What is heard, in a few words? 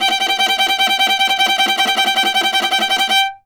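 viola tremolo G4; Orchestral; DirectWave; Varazdin Orchestral; Viola II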